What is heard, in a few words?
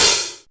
HH OP, HH OPEN; USB Soundscan vol.20 - Fresh Disco House I